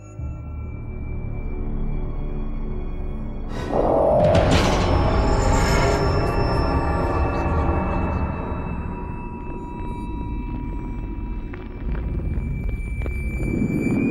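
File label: Halloween music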